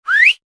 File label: human whistle fingers attention; Person Whistling With Fingers For Attention; Person Loud Whistle; Voice